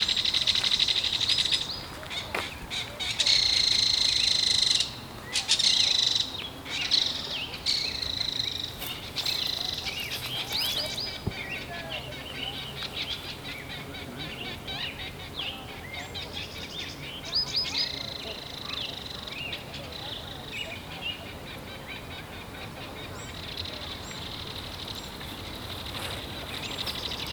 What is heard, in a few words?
belted kingfisher, birds